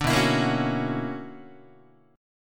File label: B Minor Major 11th, BmM11 chord, Guitar; strummed; 5,7,7,8,5,5; JGuitar